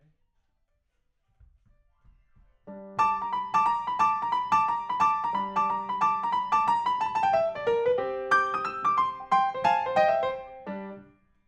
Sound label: Piano, RNR for piano